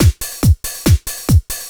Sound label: Rhythm sample; AudioFusion TWS 1.0.2